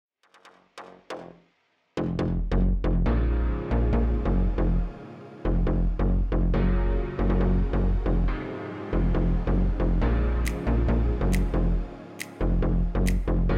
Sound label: Instrumental